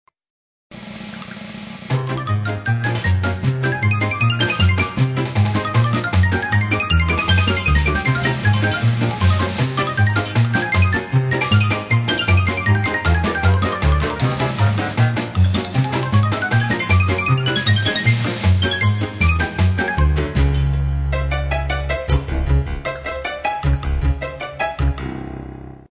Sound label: Cartoon Chase